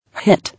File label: Hit